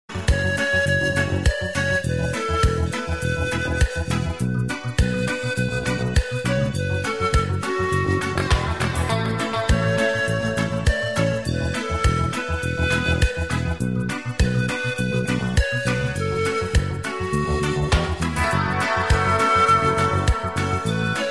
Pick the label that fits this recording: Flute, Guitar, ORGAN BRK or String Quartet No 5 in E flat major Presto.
Flute